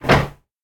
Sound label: Door Close